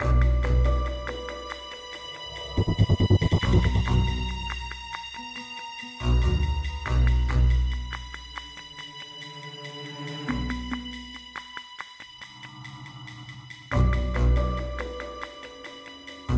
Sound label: espionage loop; espionage 1 loop a full